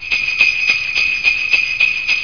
Lock Chime Jingle Bells

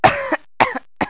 Lock Chime Cough

